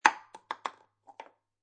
Foley, Aerosol Can Lid Drop On Table; Drops; Objects

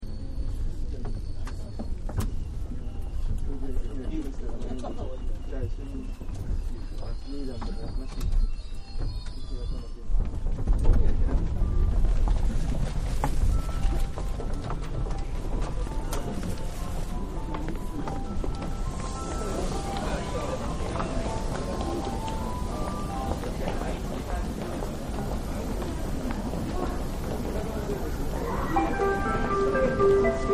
Tokyo Train Disembark; Trains; Vehicles

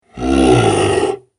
Dragon Beast, monster; horror

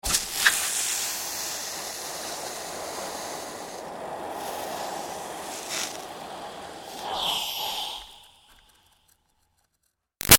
Sizzle Iron Burning Water Steam; Hisses; Big Room Sound

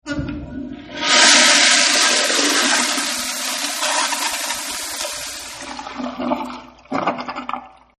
Public toilet flush, Public Toilet Flushing Sound; Public Bathrooms; Offices

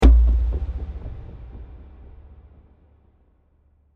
Mysterious Stab With Delays And Echos; Burst; Impacts; Crafted Signals

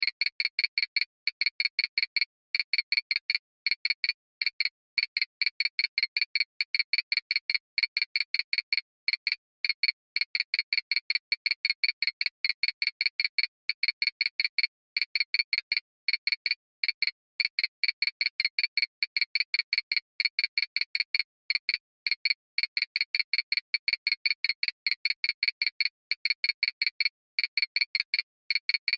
Science Fiction Control Room Beeps; Control Panel; Control Panels

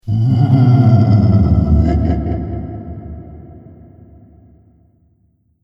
Laughter Spirit, ghost sound effect; Sinister, Scary, Spooky Or Just Silly; Andrew Fleming

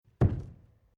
Heavy Dull Thud Foot Impact On Wooden Floor Attic; Miscellaneous Household Sound Effects

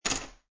Poker Chips Drop Two Chips On Wood Table; Gambling; Lynne Music

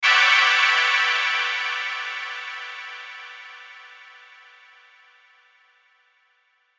Hit Burst Strings Shimmer Ring; Production Element Imaging Element Accent Transition

